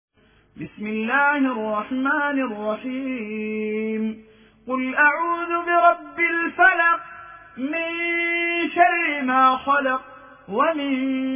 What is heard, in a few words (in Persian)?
ترتیل قاری سودانی روایت الدوری عن أبی عمرو; سوره فلق